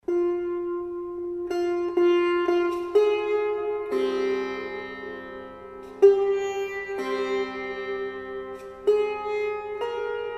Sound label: Sitar